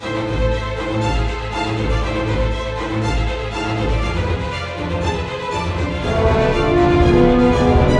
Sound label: Tension Skirmish Orchestral 120bpm; Ultimate Everything Music Bundle; Loops for All Occasions